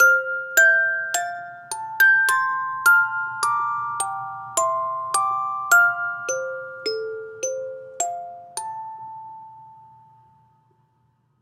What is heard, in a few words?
Music Box; Assassin's Creed Syndicate